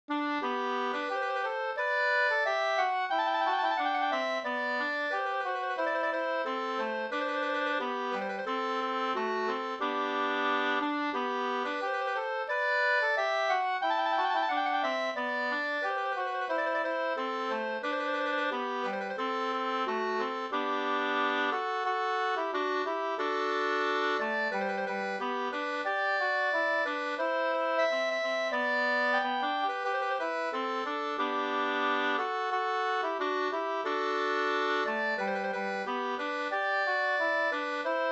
vismelodi; Tystbergavisan

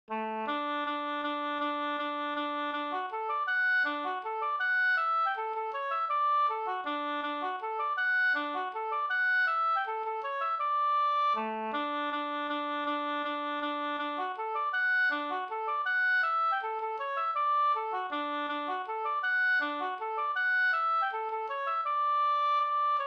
vals

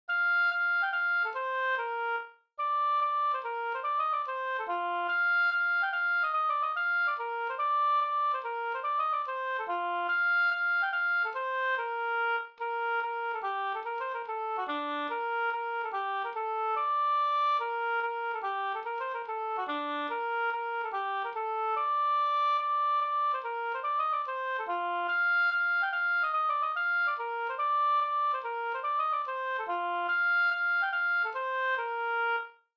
folkdans, polska; Trekarlspolska

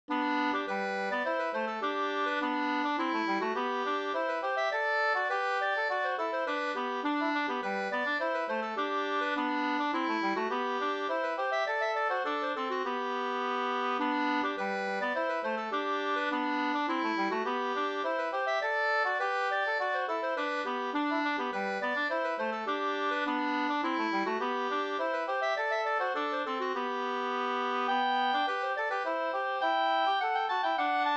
polska, 2st; Byss-Kalle

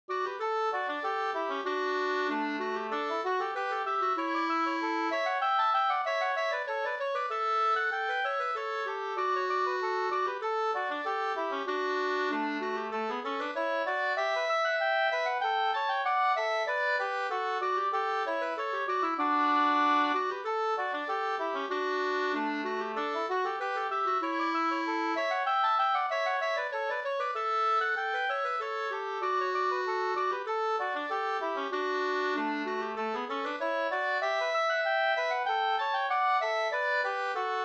ganglat D dur; Vastergyllen av Yngve Laurell

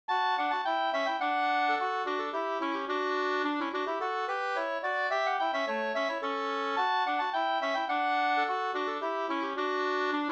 slangpolska 2st

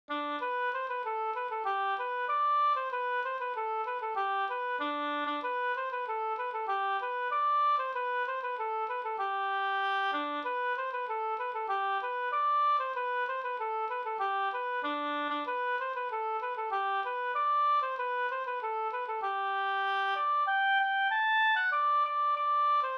brudmarsch; fr Lillhardal

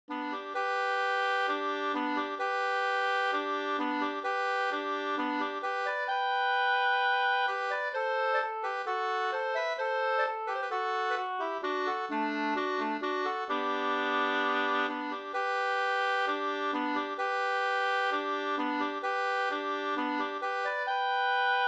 skänklåt fr Bjuråker

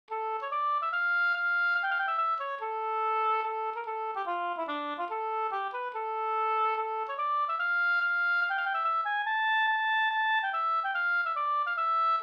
reinlender; fr Roros no e Bjorn Stabi

